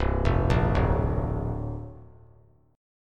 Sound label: C 7th Suspended 2nd Suspended 4th C down; C7sus2sus4 arpeggiated